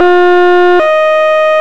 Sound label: hilo, sirens; code3 swiss